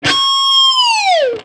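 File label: metallic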